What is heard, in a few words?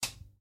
Game Automate Screen Off; Game rip; MiSide